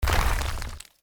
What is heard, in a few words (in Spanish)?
entra a tierra